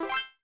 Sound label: Jingle Good Short; Die Drei Fragezeichen Das Geheimnis der Geisterinsel